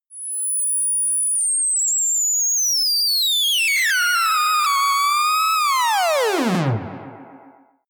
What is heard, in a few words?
dropping ufo